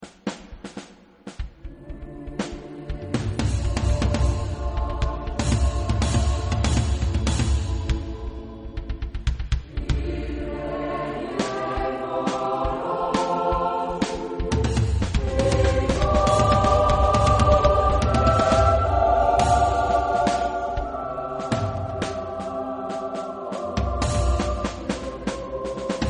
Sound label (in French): Coeur Batterie Synthe; Gala De Danse